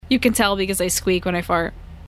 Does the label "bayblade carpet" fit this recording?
No